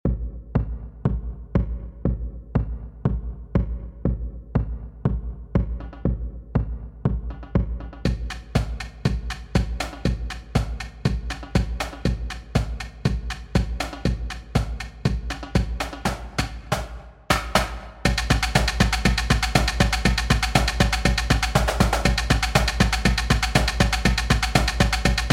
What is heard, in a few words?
Middle School Cadence Combined Normal